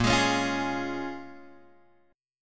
Bb Minor 7th Bb, Guitar, Open G

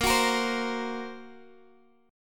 Bb Suspended 2nd Sharp 5th Bb Guitar Open F; x,17,18,17,15,x; strummed; chord shape; JGuitar

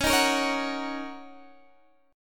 Gb 6th Db

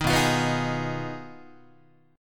B 6th Flat 5th, down, Guitar; strummed

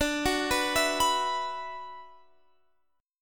D 6th Add 9th Guitar Drop D

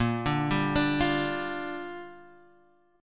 A sharp 6th, Guitar Half Step Down

